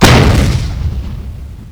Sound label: stug fire cannon; WAR